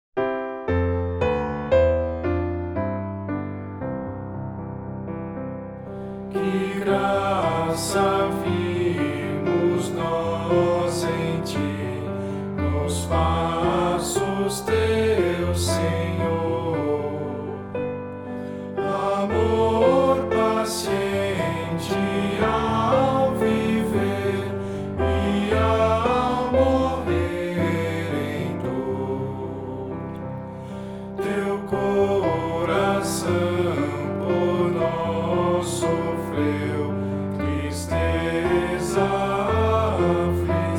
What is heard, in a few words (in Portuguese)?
voz piano